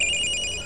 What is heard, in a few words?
blip